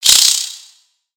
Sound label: Spider Attack Ranged; Lego; creatures mechanical